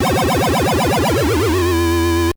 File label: sting, Arcade; civ13; civ14; Machines